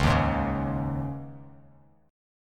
Db Suspended 2nd, Bass, Dbsus2 chord; strummed; JGuitar

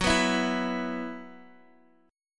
F sharp 7th Suspended 4th, Guitar, Open D

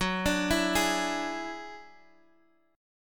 F sharp 7th Suspended 2nd Sharp 5th F sharp down; arpeggiated

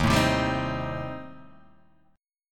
Eb 6th Flat 5th Eb down; Eb6b5 strummed; JGuitar

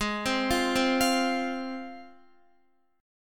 Ab 6th Ab, Guitar; x,11,10,10,12,12; Ab6 arpeggiated

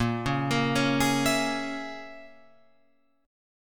Bb Minor 7th Flat 5th, Guitar, Open F; Bbm7b5 arpeggiated

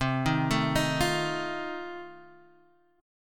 F 7th C

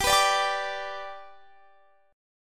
G Major down Guitar Standard; G strummed; JGuitar

